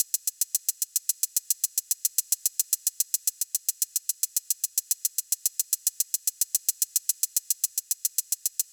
Drum Loop 110 BPM Hihats; Experimental Drum Loops; Loop Stems; Cymatics; Mystery Pack Vol 4; Weekend Drum Loop

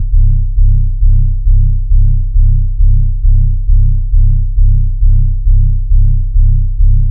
Bass Loop C 135, Techno

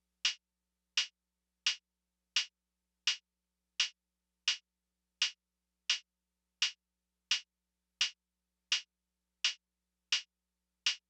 Diffuse Drum Loop 85 BPM Hihat, Lofi; Cymatics; ANALOG EVOLUTION Beta

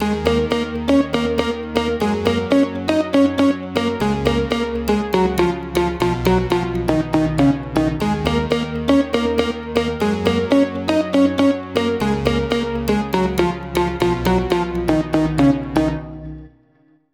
Synth D# 120Bpm, House; Musical Loops; House Kit; Ghosthack; Advent Calendar

